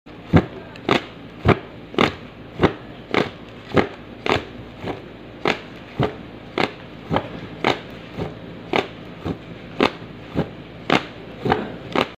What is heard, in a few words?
hand fan